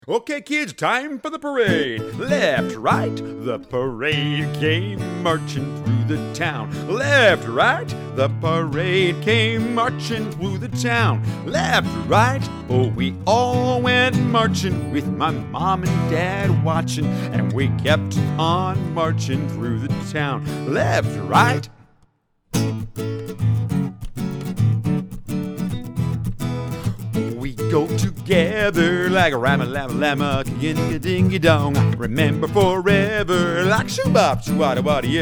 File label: Childrens